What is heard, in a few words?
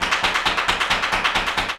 flamenco tapping, jaleo